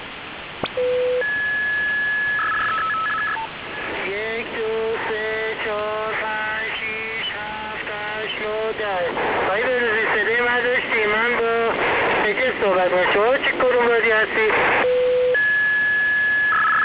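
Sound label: usb unidentified selcall fsk-2; msk 14640 khz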